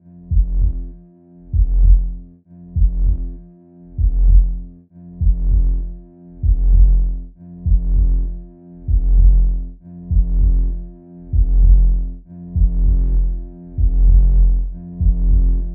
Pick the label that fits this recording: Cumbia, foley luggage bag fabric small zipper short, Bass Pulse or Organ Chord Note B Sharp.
Bass Pulse